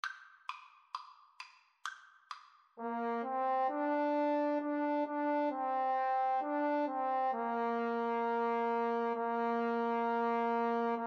Trombone Duet

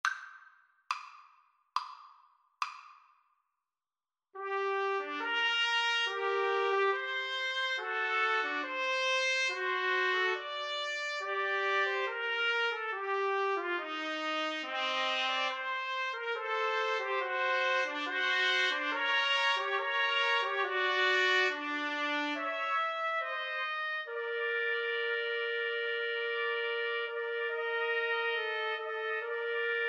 TPT trio, Trumpet Trio

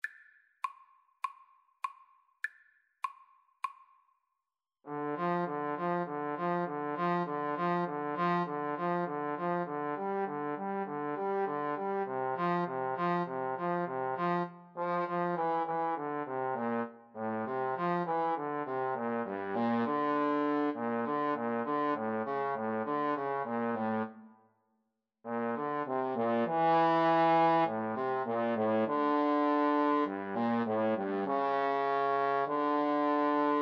Trombone Duet

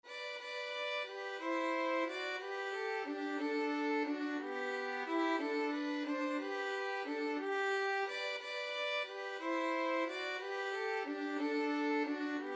sussex carol, violin duet

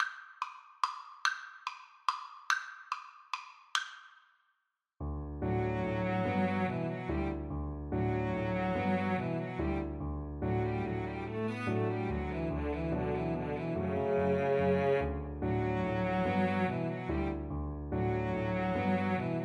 Cello Duet

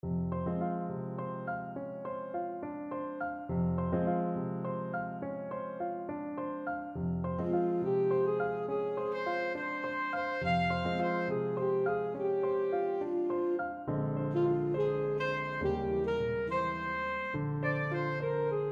ASAX, saxophone

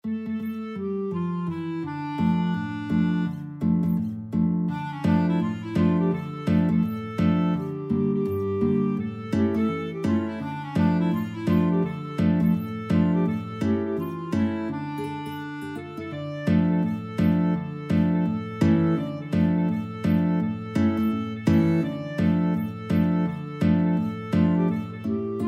guitar clarinet duet

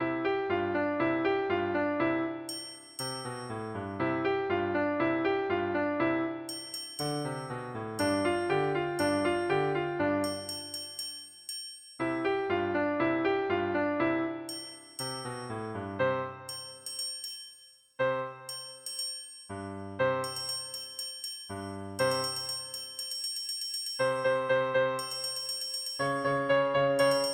triangle concerto; percussion

